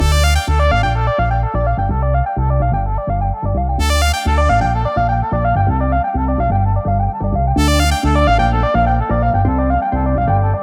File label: Loop